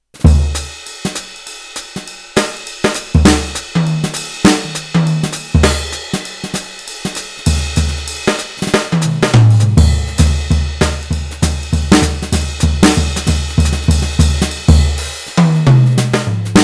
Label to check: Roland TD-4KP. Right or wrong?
Right